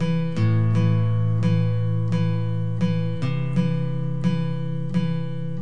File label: moody guitar metronome; GUITARS